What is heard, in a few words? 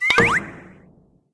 Goal Done; Farm Mania